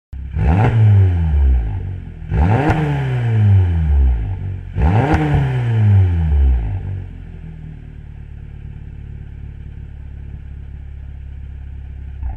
TCR Revving Sound; motorsport

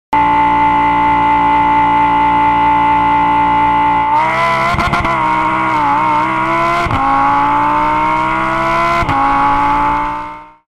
GT4 Pit Exit Sound, Audi R8 LMS GT4; motorsport

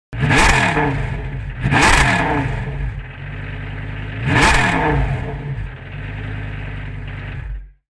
GT3 Revving Sound, Audi R8 LMS GT3; motorsport

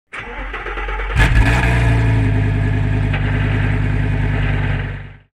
GT3 Start Sound, Audi R8 LMS GT3; motorsport

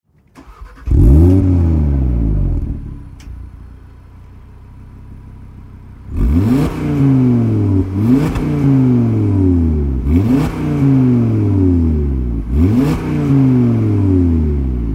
Audi RS3 neu engine on idle revving engine off; production models

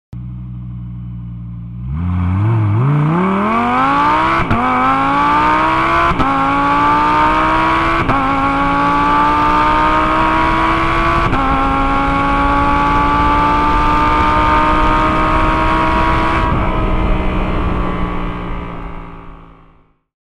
GT4 Accelerating Sound; motorsport

